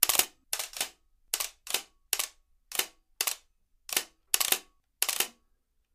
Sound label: Camera Lens Shutter Mec, shutters & movement